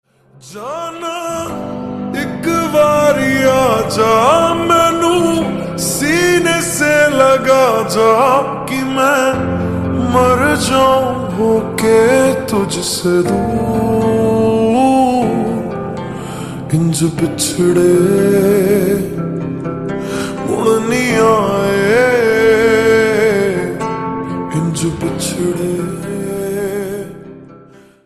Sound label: Acoustic Version Slowed Reverb Ringtone